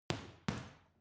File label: boxing punching bag side hook double medium; sport freizeit; boxen